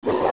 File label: Bubble Attack; zelda; OOT